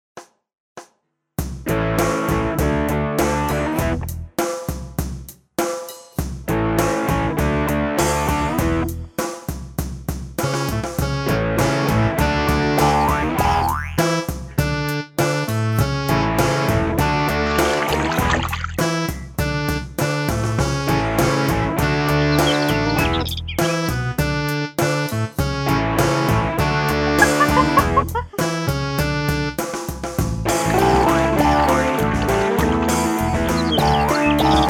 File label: karaoke